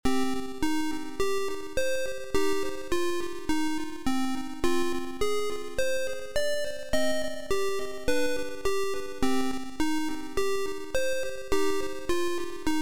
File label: Instrumental